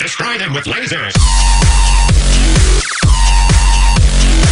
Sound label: Destroy With Lazers